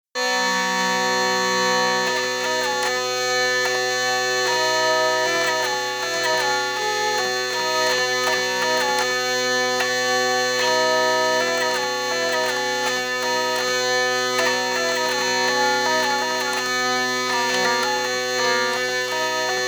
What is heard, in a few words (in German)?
Ultener Drehleier; kompakt juen